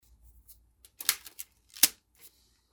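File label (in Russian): щёлканье канцелярской резинки на пачке; Звук купюр, долларов, отдельно и в пачках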